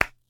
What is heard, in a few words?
idle marble clap; smite; god merlin